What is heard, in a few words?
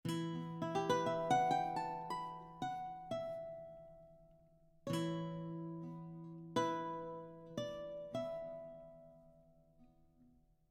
baroque guitar